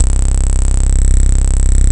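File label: D50 bass, right; East West - Phat & Phunky CD2; 90's Sample CDs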